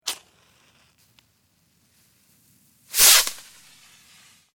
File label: Rocket Small, Match strike and rocket take off close; Firework